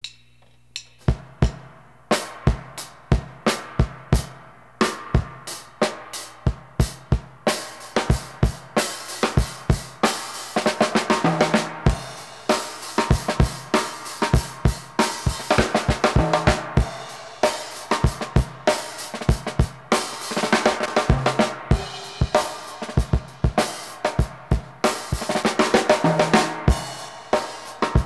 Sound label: Drum Test Reel to Reel